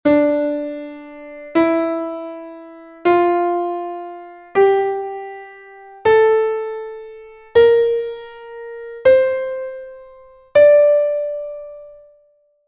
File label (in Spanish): escala de Re menor; major and minor scales and chords